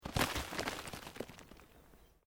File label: Earth Loose Move Short